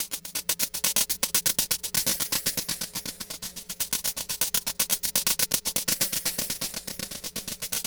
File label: Glitchy, Beats